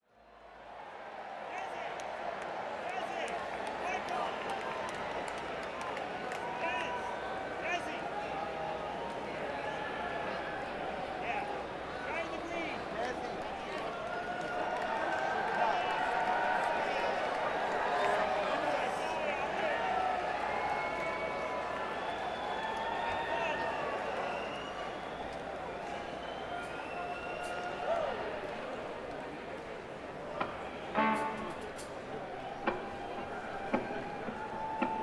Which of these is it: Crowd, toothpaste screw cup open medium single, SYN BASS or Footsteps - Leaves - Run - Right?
Crowd